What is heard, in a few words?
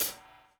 DOWN, DOWN KIT 78